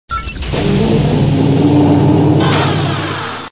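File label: metal door closed with a creak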